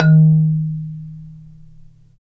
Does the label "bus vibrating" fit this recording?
No